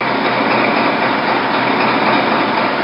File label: N idle, Dv13; finnish